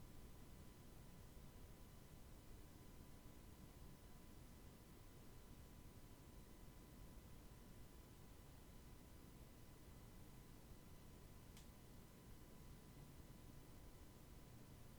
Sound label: Noise test